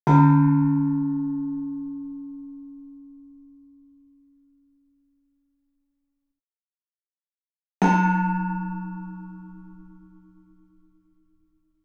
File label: Gamelan; Propeller Island - Complete Gamelan